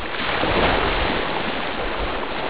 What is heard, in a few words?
Ocean; Master Clips Email Animator